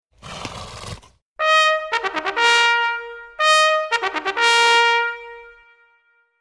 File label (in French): Sonnerie Garde A Vous